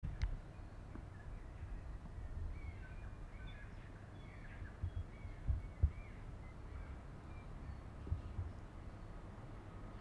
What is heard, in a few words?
birds and bangs; Port Chalmers